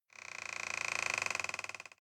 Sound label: wood creak